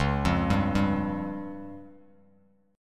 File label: C sharp Major Flat 5th, Bass Standard; C#Mb5 arpeggiated; JGuitar